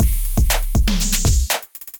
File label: Electrified Kit; Electrified Kits